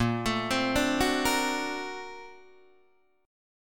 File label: Bb Augmented Major 9th Bb down Guitar Open D